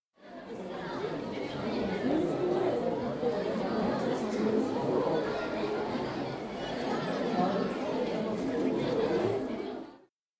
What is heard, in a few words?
crowd chatter; anemoi